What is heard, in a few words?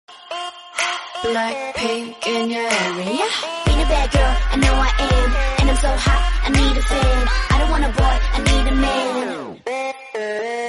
English Rap